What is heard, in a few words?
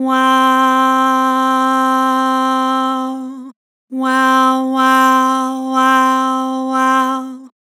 Vibrato Wow, Vocals; Harmor Vocals